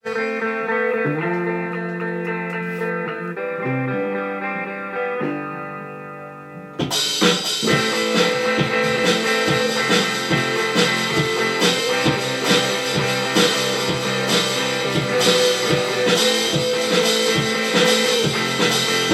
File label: drum preformance